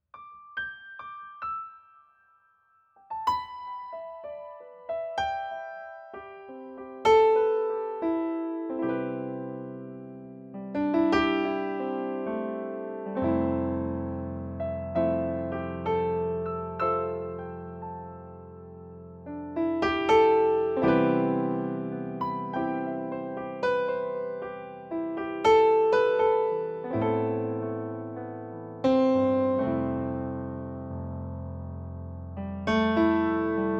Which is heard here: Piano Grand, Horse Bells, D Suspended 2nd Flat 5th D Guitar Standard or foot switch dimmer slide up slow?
Piano Grand